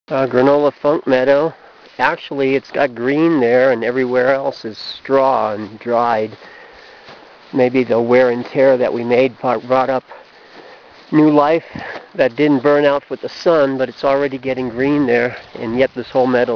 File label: Granola Funk